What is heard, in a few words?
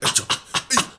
HEY HEY, MALE NOISE, Heart of Africa